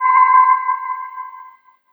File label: SYN, 160 BPM, Drum & Bass